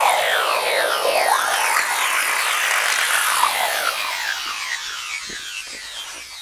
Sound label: Suction, Environments; Sonic Foundry (Sony Creative Software); Myths of Technology